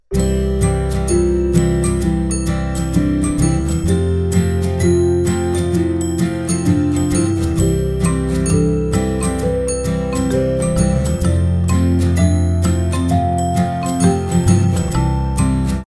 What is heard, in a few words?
Toy Piano